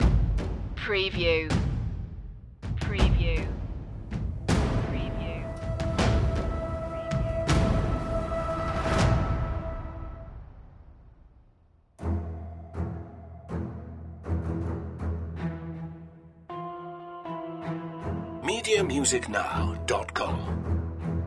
savage future no hybrid

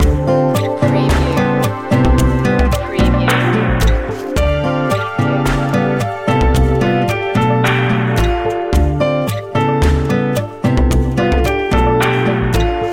lounge water loop

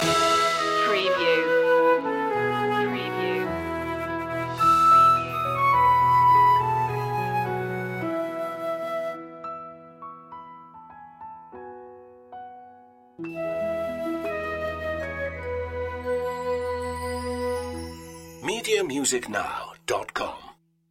orchestral loop end; joy to the world; media music now

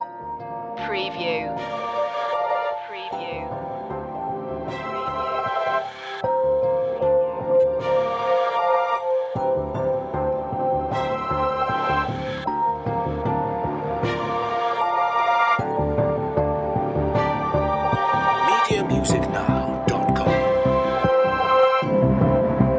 trailer

